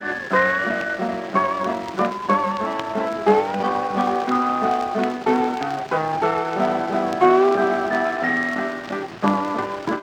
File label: Hawaiian waltz; loc-edison